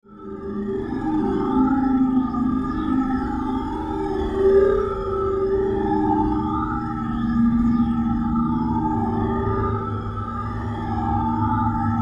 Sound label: DRONE FILTER, Drone Filter Slow Flange; Blastwave